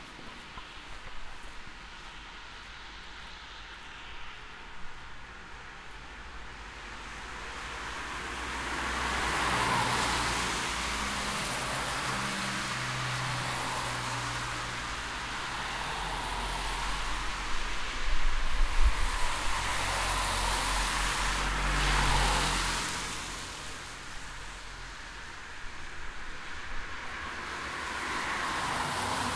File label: cars passing winter; Cars passing, on a suburban road, around Christmas, melting snows on the ground.